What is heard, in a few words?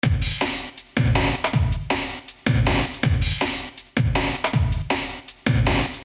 DRUMS TAIL